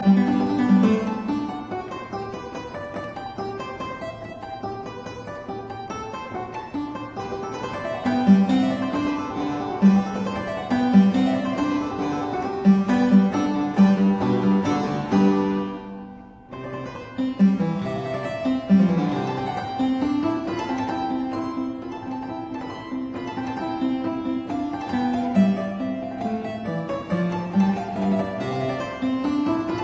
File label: Allegro in D major; Carvalho